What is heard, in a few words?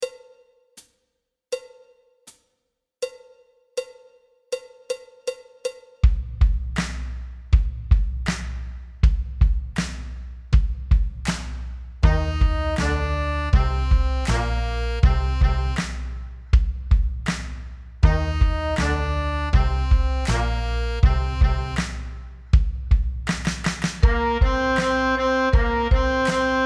Power Rock Playback 160, Tempo 160